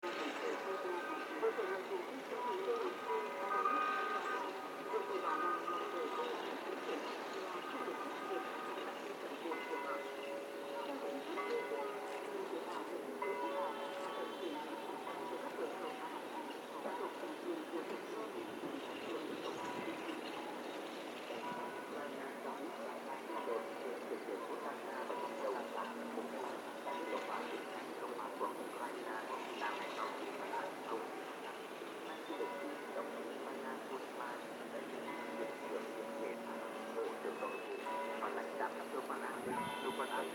ambient sounds; Soft ambient sound; Vietnam; I recorded a lot of sounds in Vietnam

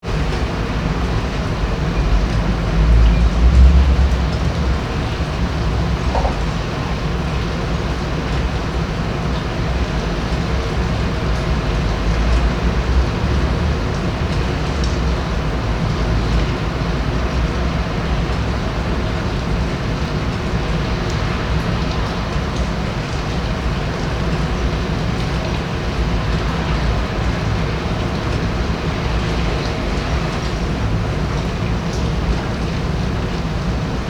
distant cars; Cars far away

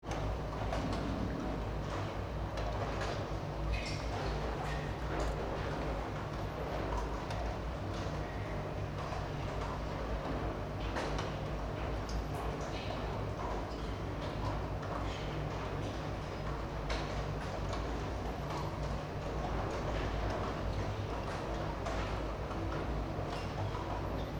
dripping rain shanghai suburb; Rainy day, Shanghai suburb (1)

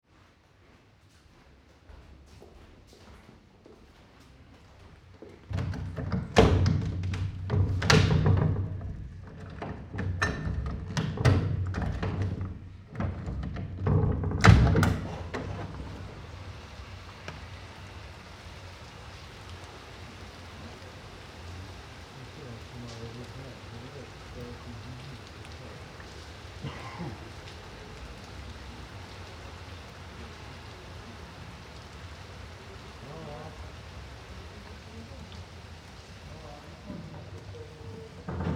rain italy

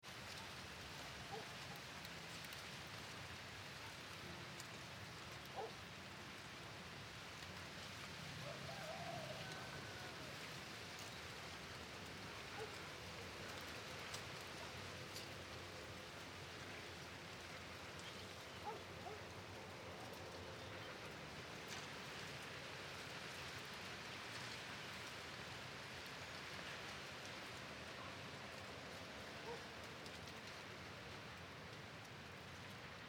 wind italy

